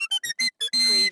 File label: CARTOON CHARGE